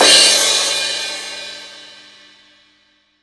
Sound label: rock crash, Crash Cymbal, mono crash; AKAI S6000 CD-ROM - Volume 3